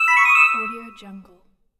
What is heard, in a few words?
level score tone; drtimwaters